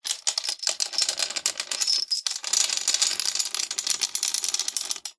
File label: industrial chain small attach to wrap around metal pole